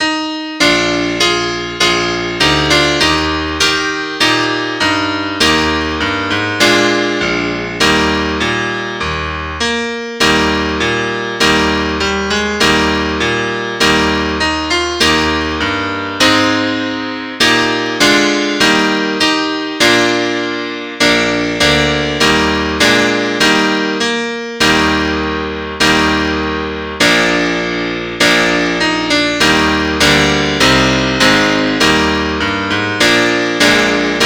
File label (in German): Klavier; st martin